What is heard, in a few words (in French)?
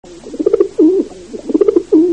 Pigeon Biset; Columbides; Pigeons, Tourterelles.; Passereaux